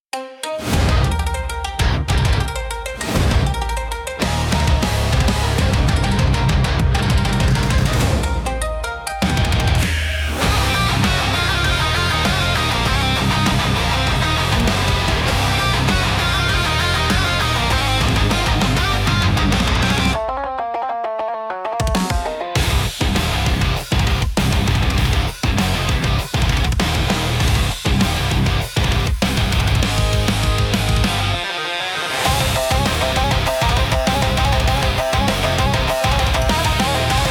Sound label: intense japanese rock